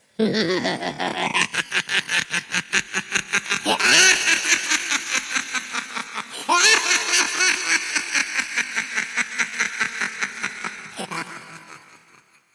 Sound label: creepy laugh high; vincent price